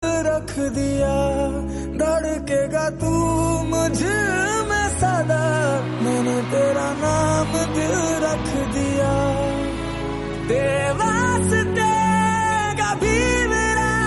flute instrumental; Ek Villain Returns

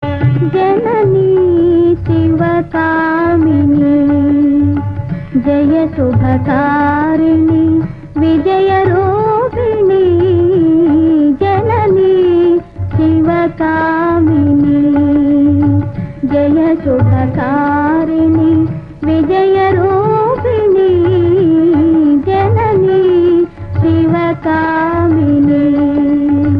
telugu devotional; nartanasala

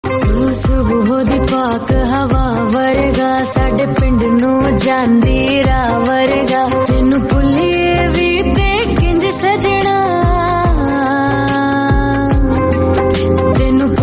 punjabi

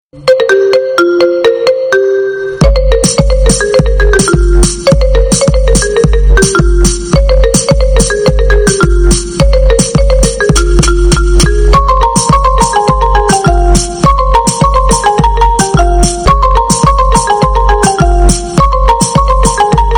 Marimba

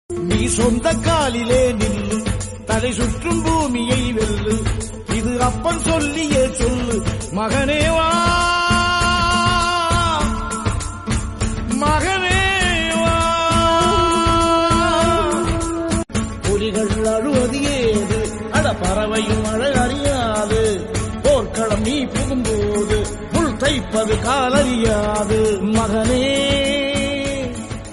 tamil song

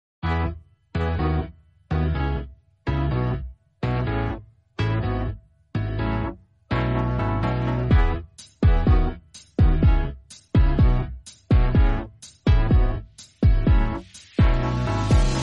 instrumental bgm english

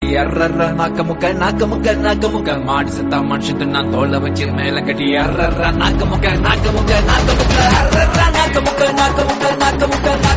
tamil song

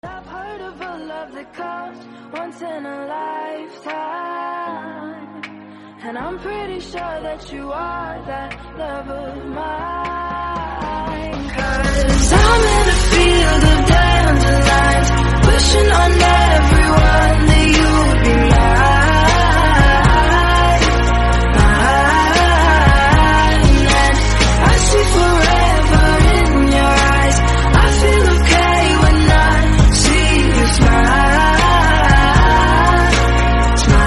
lofi english song

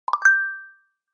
google pixel notification tone

